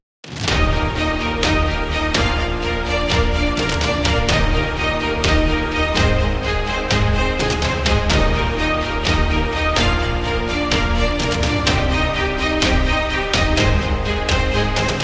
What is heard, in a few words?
Motivational Positive, corporate music; corporate music vol 20